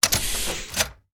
Hatch Up; MiSide

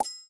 Money; Game rip; MiSide

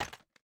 Magnet Drop; Game rip; MiSide

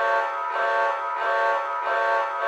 Signal; MiSide

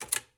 Button 2D Up; Game rip; MiSide

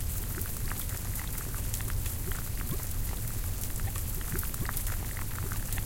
Lava; MiSide

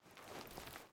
Cloth Start; Game rip; MiSide

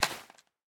Remove TV Drop; Game rip; MiSide

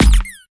Hammer Kick Player; Game rip; MiSide

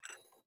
Cup Take; MiSide

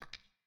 Fight Step; MiSide

